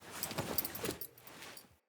Foley Action Default Polearm Spin Attack, Clothing; Player